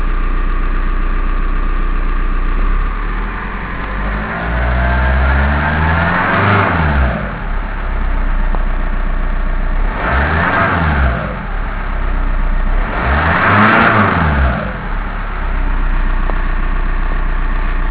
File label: 1998 beetle running; vw